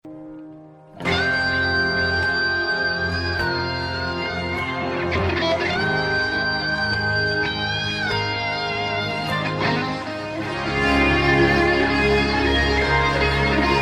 solo breakdown

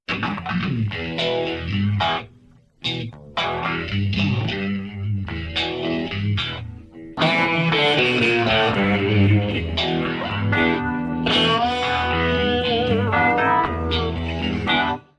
intro guitar extraction

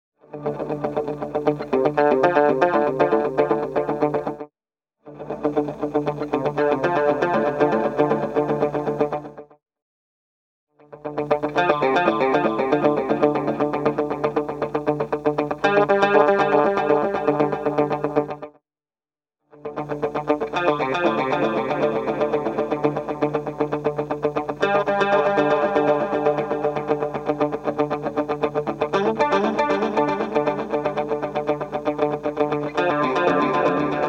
dual delay comparison

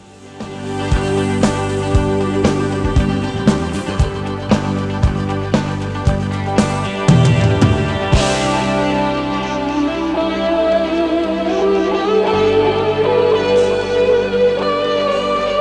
Solo; Torino, Italy

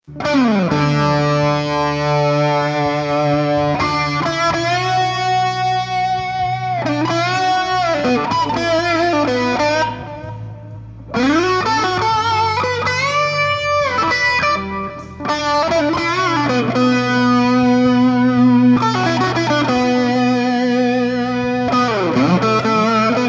SPC EXG SPC OFF MUFF

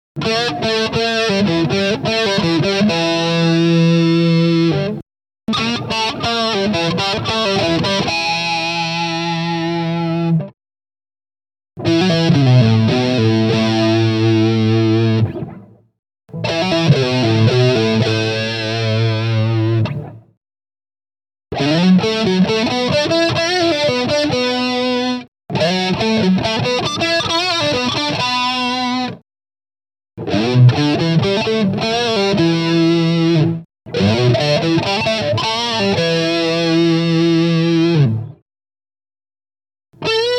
Harmonic Picking Samples

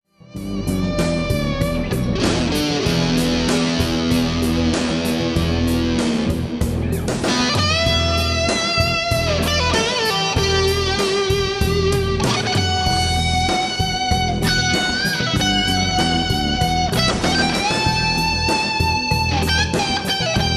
Earth Drive Sorrow Solo EMG Strat

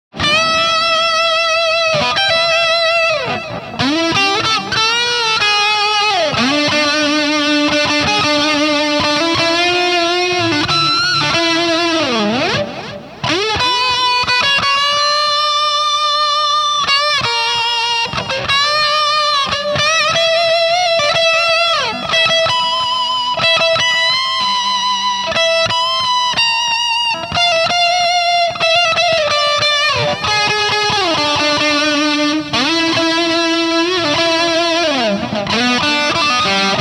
Rat with gain maxed Eclipse

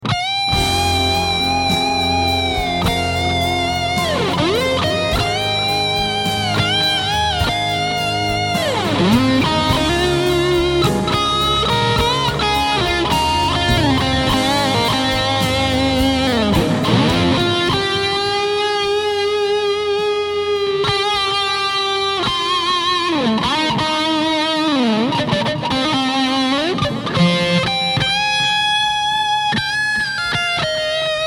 Solo

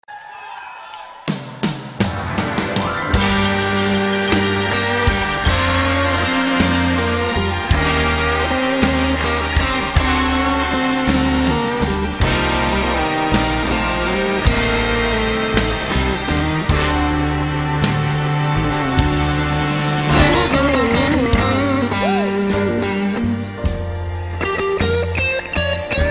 Solo

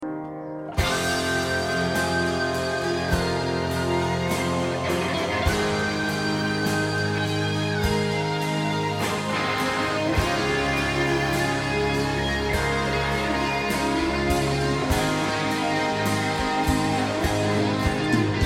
modulated guitar solo

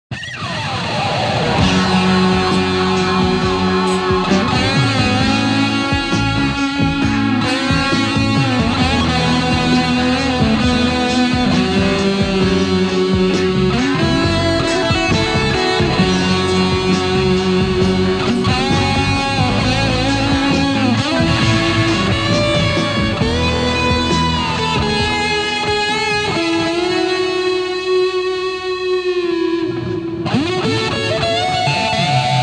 Solo, EHX Green Russian; SSL5

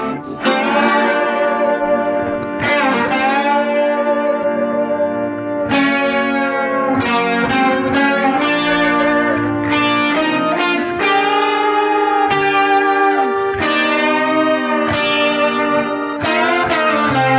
fourth guitar solo extraction

